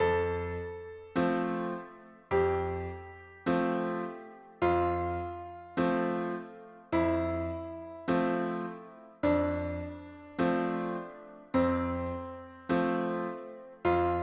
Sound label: suspended chord resolving downward